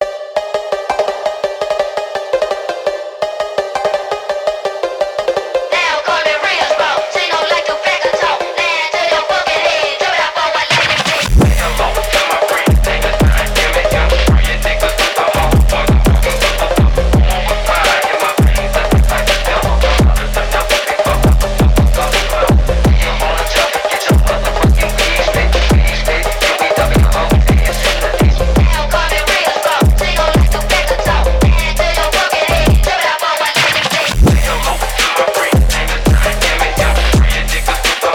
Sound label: rocket phonk music